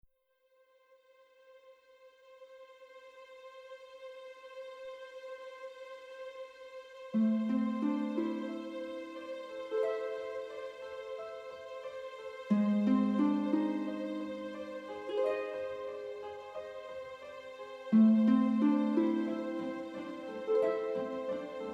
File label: Mystery Suspense